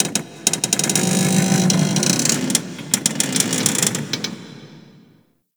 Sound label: Ocean Close Metal Creaks; Ambience; Warframe; Grineer Ocean